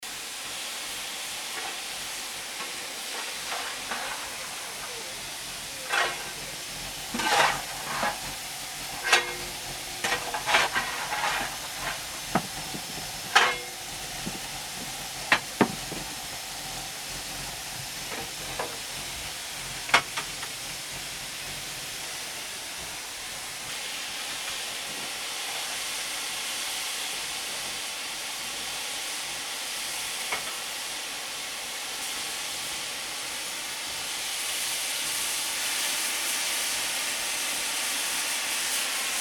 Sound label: steam train departs